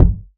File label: boom kick sound c sharp key, kick drum; kick drum samples